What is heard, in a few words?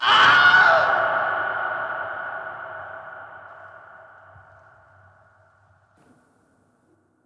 fall death